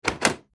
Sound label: magical door swing